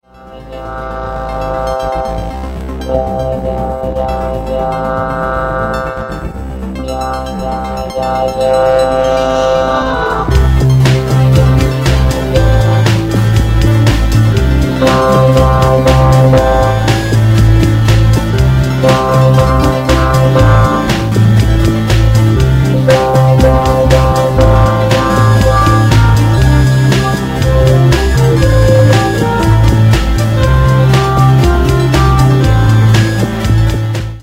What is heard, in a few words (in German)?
klingelton volldampf